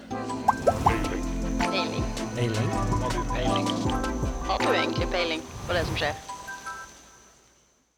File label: Peiling jingle